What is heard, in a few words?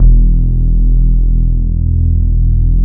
BASS, Bass Analog & Synth; ORGUASMIC; USB Soundscan vol.12; AKAI